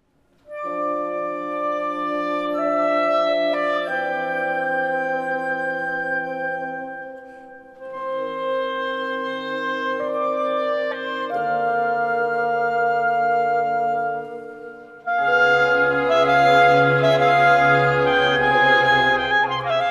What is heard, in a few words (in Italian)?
Assolo Per Clarinetto